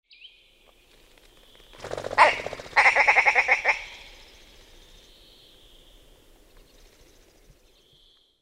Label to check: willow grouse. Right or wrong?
Right